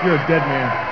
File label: sting